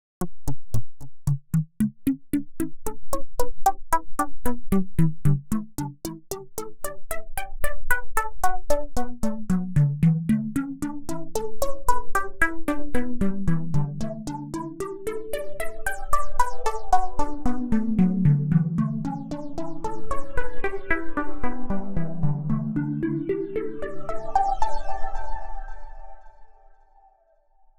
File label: trance plucks, 113BPM